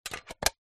tin small craft paint set lid on; zapsplat; foley

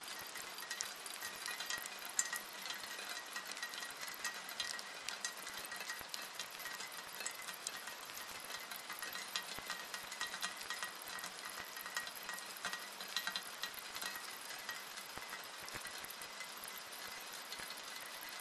rain city light fine ringing; PMSFX

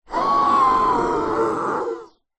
horror monster scream wide stereo; zapsplat

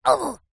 cartoon voice high pitched pain grunt

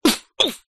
human fight karate vocalisation male

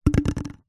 cardboard tube toilet roll drop wood floor; foley

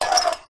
foley metal sharp scrape

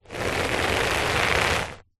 household hairbrush scrape bristles on carpet

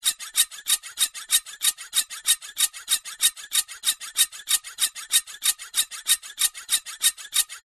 foley metal scrapes squeaks multiple rhythmic

